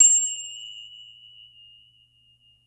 finger cymbal lo; Percussion; Sonatina Symphonic Orchestra

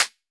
clap

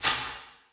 shaker lo b; Percussion; Sonatina Symphonic Orchestra

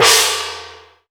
GONG

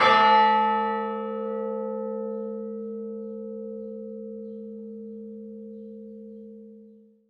chimes a3, percussion; Sonatina Symphonic Orchestra

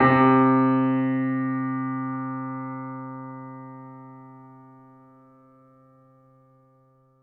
piano f c3, Grand Piano; Sonatina Symphonic Orchestra

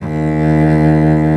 Solo Cello D#2; Strings

